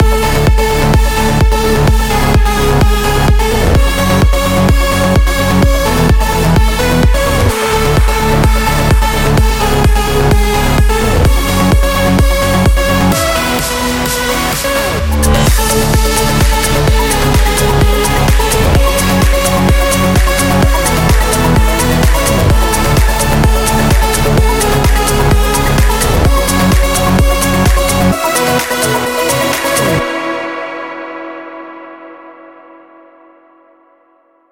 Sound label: Construction Kit Loop Full